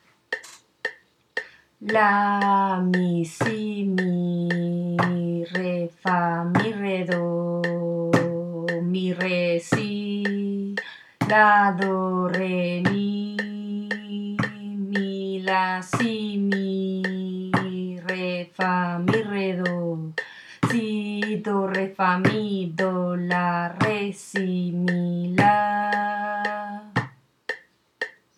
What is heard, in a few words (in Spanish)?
RITMO